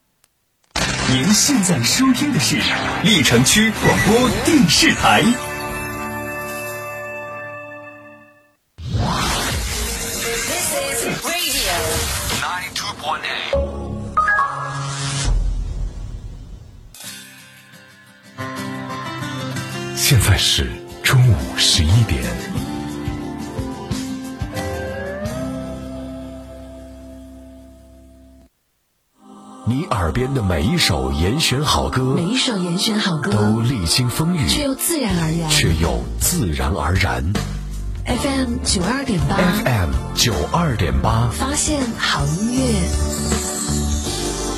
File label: 928MHz streaming